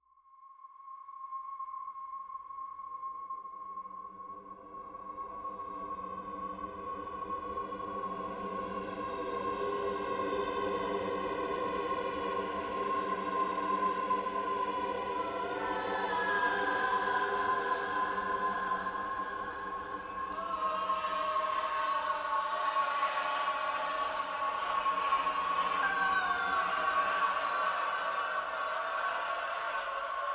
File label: wailing caves